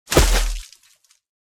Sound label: Hit The Giants Eye Flesh; Imperius